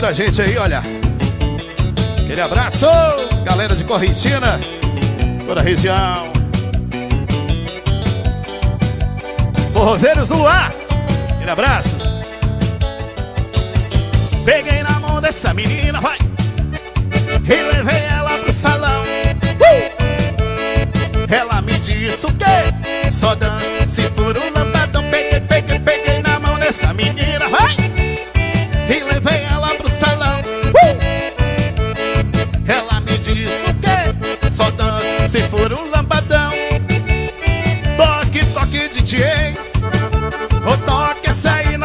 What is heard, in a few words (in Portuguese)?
forró